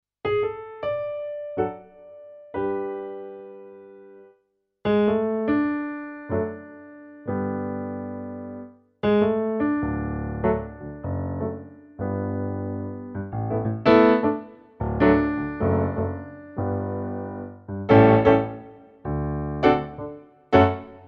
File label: Yamaha P S500 Boesendorfer Piano